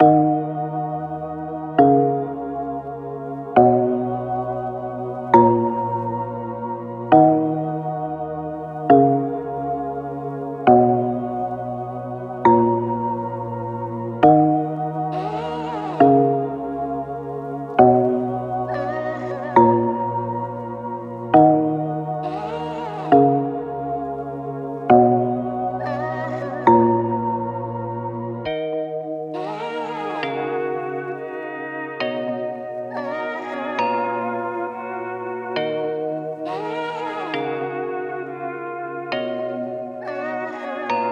135BPM D m Major Loops; Wraith

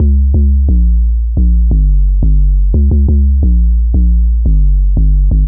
175 D HEAVY 808 LOOP

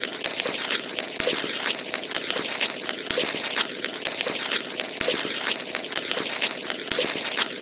126 Tops

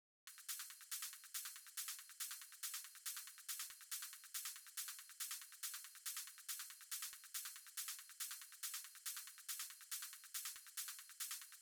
Hi Hat Loop

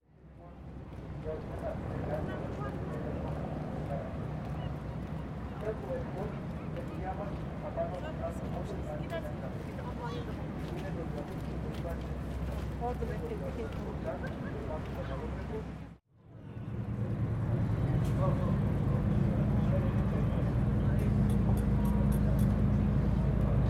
Loop Lounge The Sound Of Istanbul

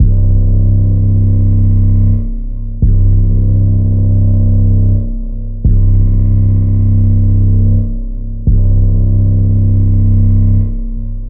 bass C 85 broken

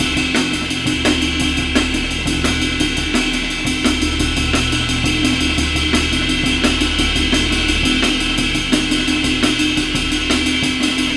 BREAKS, AMEN BREAK, RIDE CYMBAL

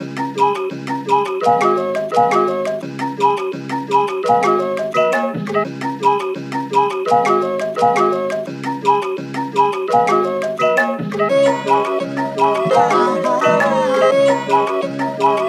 melody loop gameshow 85 Dbmaj; Nik Dean

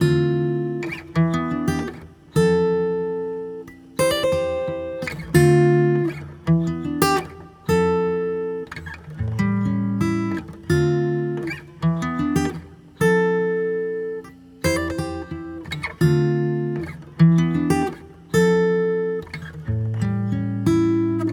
guitar acoustic D min; pollucite

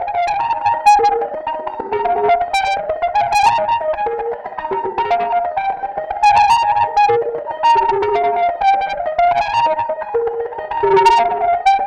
158 synth loop Cmin

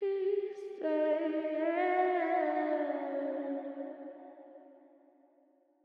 vibe Ebm; He Said

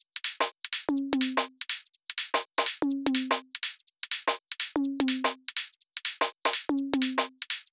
Drum Loop 124 Perc

